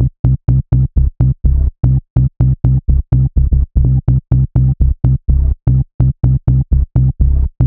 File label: Bass